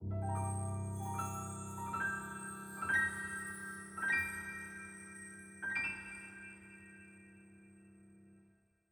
Fairy Unlock

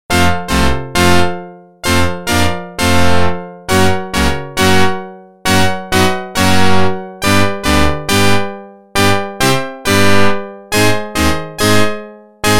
Organ

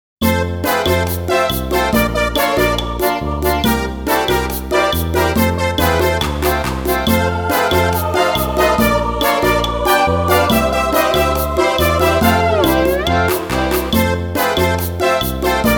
Comical de Rumba; mahoroba stories library of fortune original soundtrack 2007

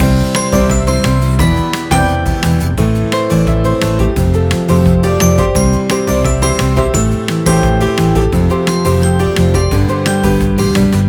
Level Win Idle

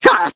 Medieval Sad; cut the rope time travel; android

